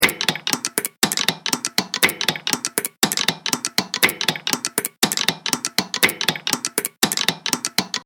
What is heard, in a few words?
Industrial Revolution Machine Loop, machinery sound effects; Loopable Sound Effect; Andrew Fleming